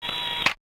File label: Camera, Preparing Sound; SKY IM-U450L